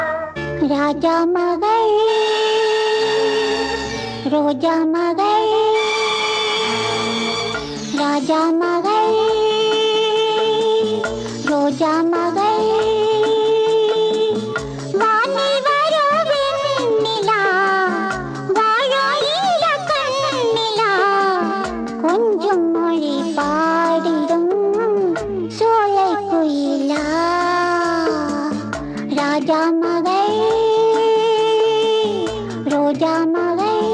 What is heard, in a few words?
female; JaiShankar